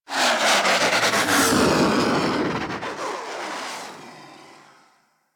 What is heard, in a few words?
Fighter Ship Attack Maneuver E, Space Fighter Ship Engine; Warframe; Railjack; Enemy Ships